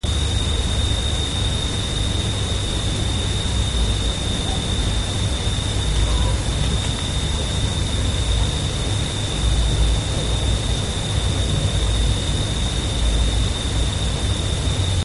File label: Night Sounds